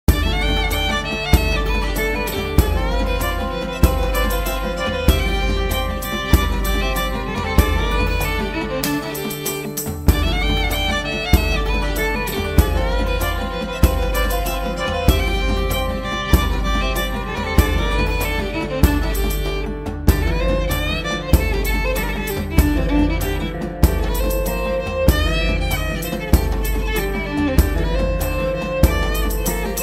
Dark fantasy Pirate tavern epic fun mini game music